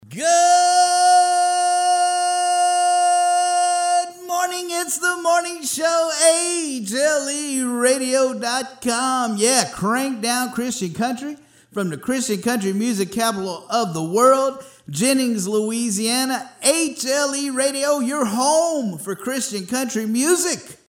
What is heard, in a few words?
Morning Show Sign On Ringtone